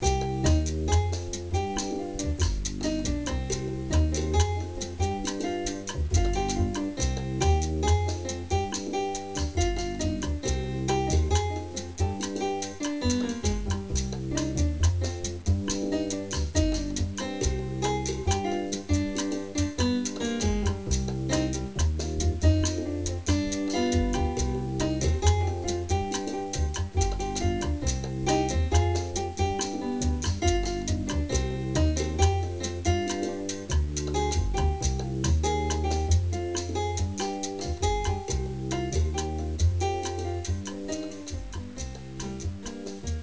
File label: salsa korg